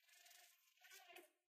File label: amb Insect Oct Up; willyshotchillies